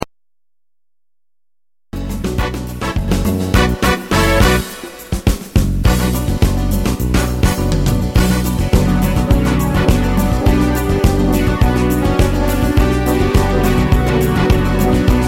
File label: samba; karibik